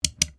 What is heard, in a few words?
mouse bad